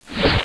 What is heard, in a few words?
Spikes; Bugs Bannis; Squish 2.6.8